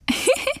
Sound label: Emote Female Happy; Idle Champions of the Forgotten Realms